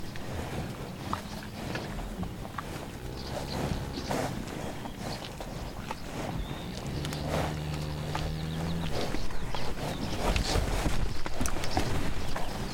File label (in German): ATMO Wiese mit Weidetieren Rinder